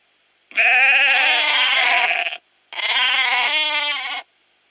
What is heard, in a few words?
Sheep